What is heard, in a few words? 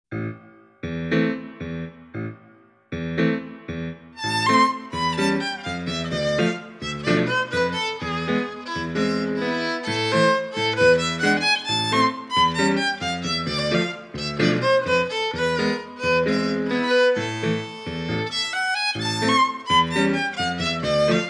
Tango; contra variant